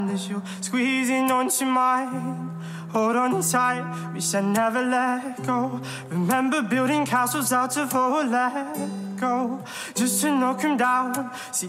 christian ringtone, christian gospel ringtones

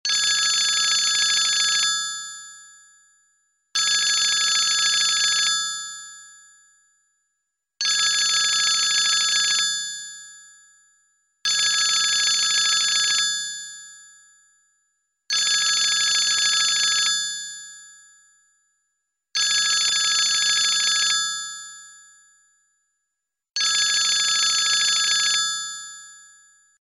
classic iphone ringtone

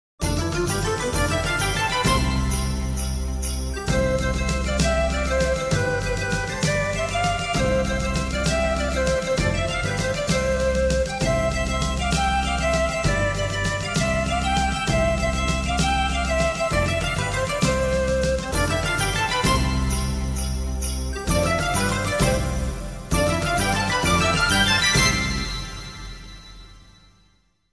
xmas jingle ringtone

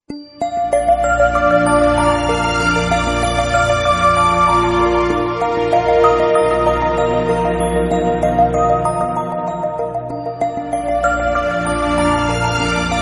new background music call notification ringtone

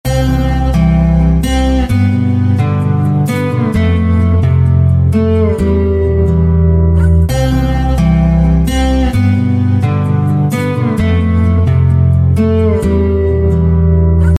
sad guitar ringtone

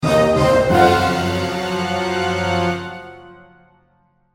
dun dun dunnn short sms tone

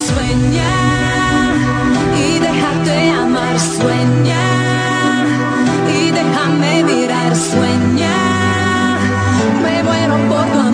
lenovo fantastic clang ringtone

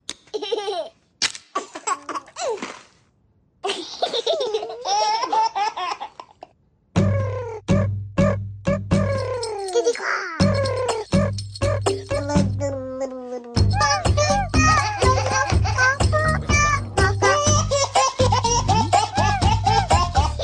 cute baby tickling laugh ringtone; cell phone

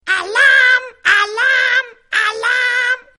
alarm alarm ringtone

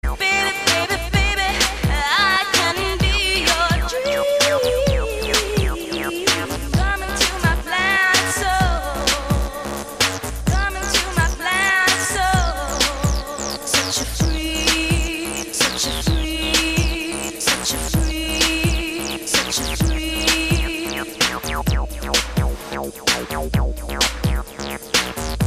rock tone ringtone

